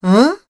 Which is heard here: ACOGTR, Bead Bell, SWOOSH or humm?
humm